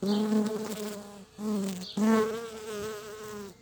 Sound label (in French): Abeille BZzzZzzt; Vols Bourdn abeilles